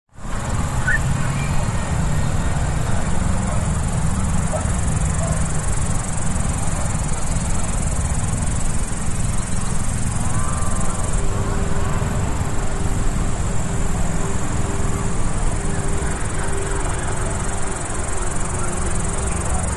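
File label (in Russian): zvuki leta, summer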